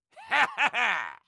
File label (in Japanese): 攻撃